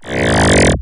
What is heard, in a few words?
ROLL TO, Anime Sound FX; COMIC